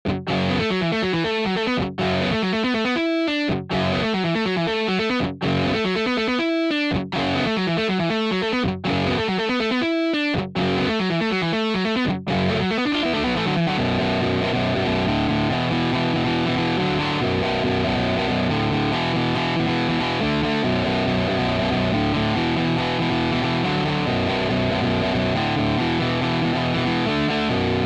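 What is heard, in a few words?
lespaul amp distortion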